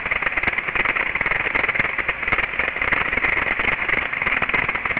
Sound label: gearbox damage